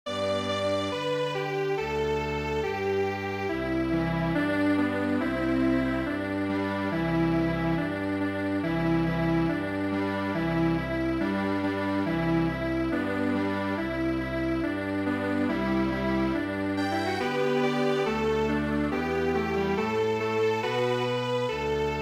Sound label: Strings Quartet, traditional; The Returning Hunter